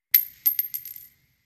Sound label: Shell Casings Single